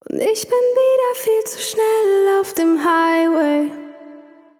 flexverb; Pop Vocals abmischen

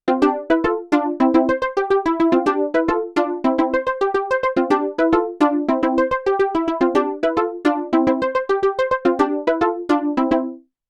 modal electronics cobalt5s

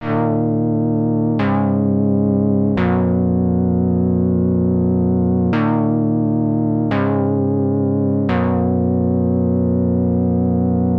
korg minilogue sound hit

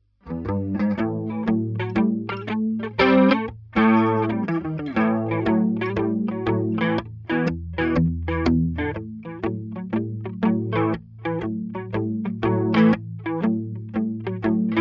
chorus

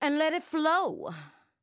free vocal samples

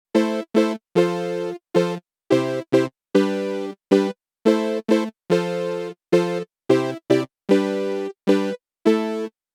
Casio SA 80; 76 Synth

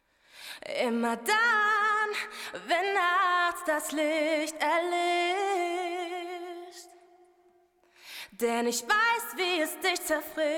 tc-helicon voicelive play gorgeous hall

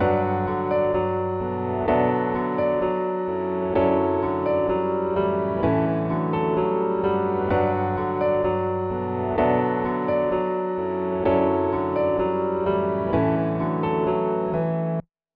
mirror piano loop wet; JMG Sound

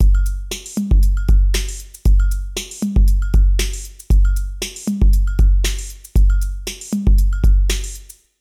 Alesis SamplePad Pro

